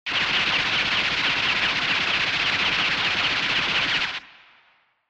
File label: Retro Anime Gun Long